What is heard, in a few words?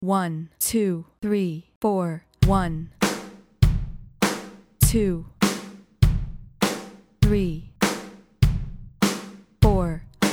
Rock Grooves at 100bpm